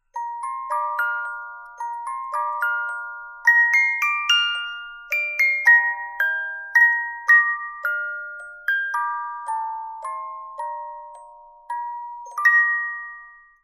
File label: musicbox